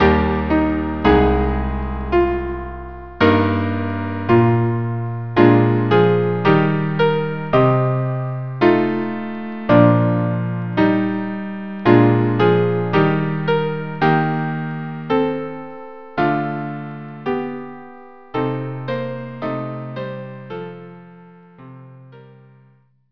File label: quartet; nimrod